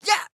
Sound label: Jump